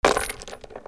DOGS

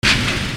INDUSTRY

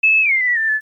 SCIFI

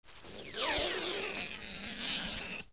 OFFICE

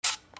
CAMERAS

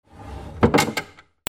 HOUSE

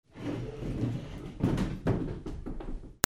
FRICTION

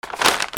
PAPER

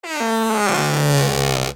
TOYS

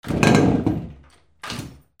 VEHICLES

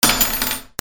FALLING

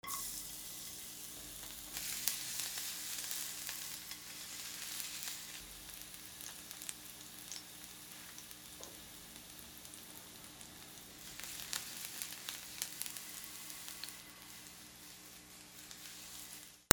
KITCHEN

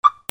ALARMS

